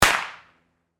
Firecracker Bang, Fire, Firework, Pop; Fireworks

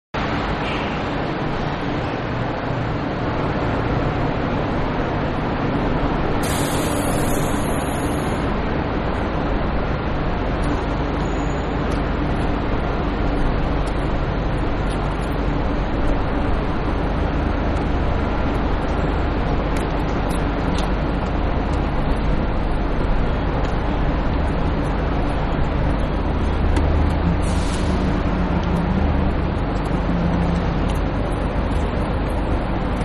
Bus Arriving Unloading; Transportation; Backgrounds; Manhattan Production Music

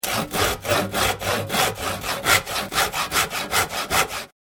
industrial tools saw wood; Hand Saw Sawing Wood; Machines; McKinney Sound

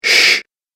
Shhh Be Quiet Sound, Whispering; Whispering Sound Effects

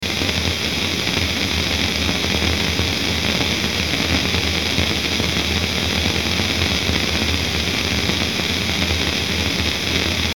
Bed Static Crackle; Blastwave

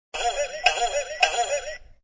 Three Boings; Cartoons

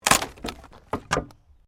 WOOD PLANK BREAK; Material components for constructing more complex sounds; Sound Effects:Materials

